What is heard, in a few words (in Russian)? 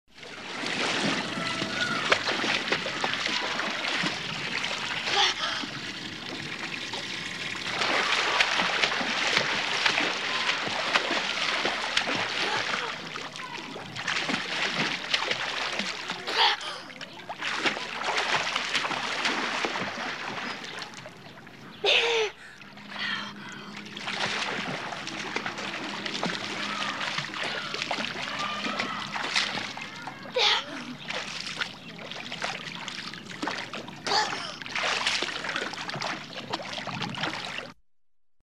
rebyenok basseyne